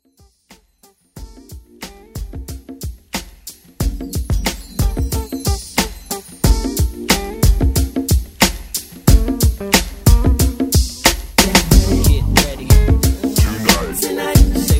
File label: Old School Redrum